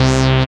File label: SYN 202, Roland MC-202